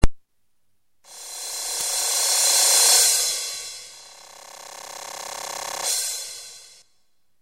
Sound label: roll